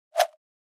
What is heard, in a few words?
Flash Gear Airy Whip